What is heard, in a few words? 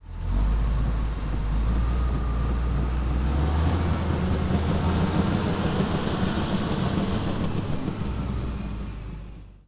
Bulldozer Driving By; transportation